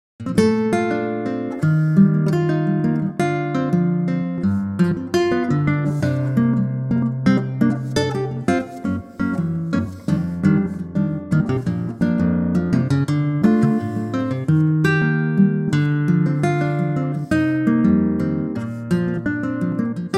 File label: Bb Ac Gtr Bossa